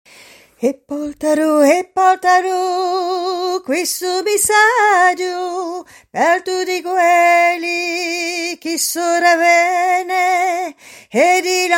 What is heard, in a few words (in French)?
Tierce refrain